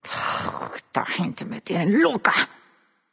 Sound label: nv grumble; smite; awilix